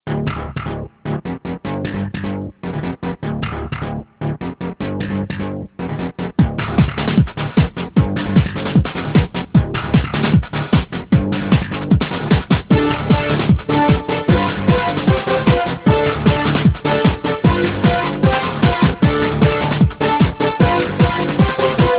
ringtone techno, instrumental

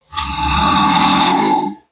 snore; human

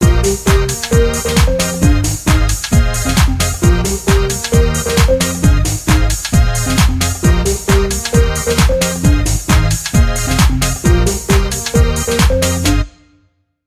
ringtone trance

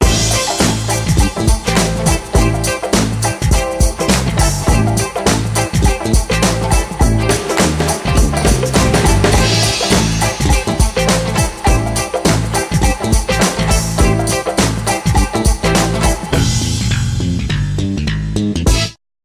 funk, instrumental

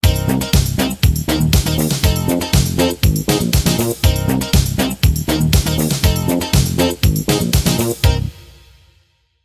ringtone disco, instrumental

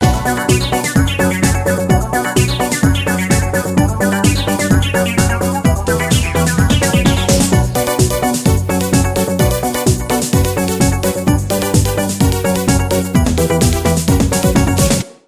ringtone modern disco

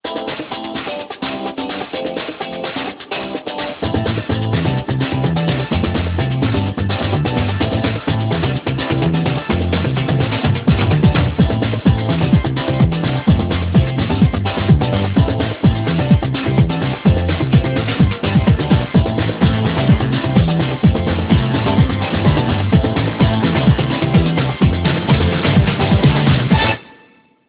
ringtone europop

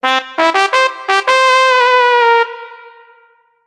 ringtone trumpet, instrumental instrument solo

